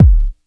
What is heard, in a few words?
kick drum sound d key